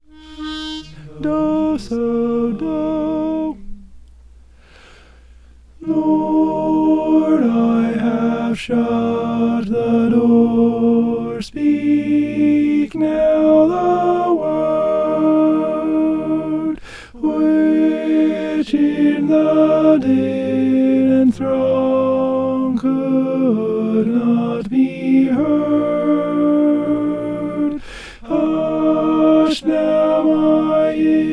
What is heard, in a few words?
sanctuary lord i have shut alto